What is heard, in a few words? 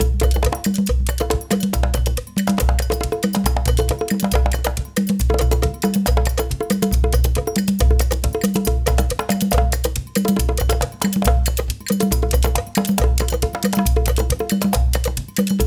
138, Lead Drm, Gyana HiLife